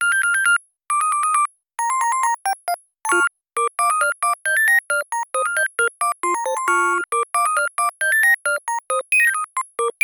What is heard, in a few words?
Simple Bell